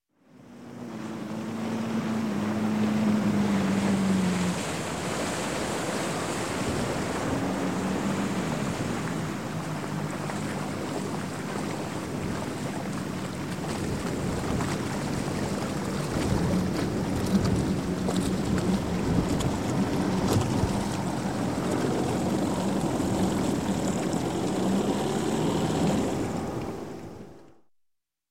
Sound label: Boat Outboard, 115 Hp, Approach Dock, Shut Off; Marine; Sound Ideas; Series 2000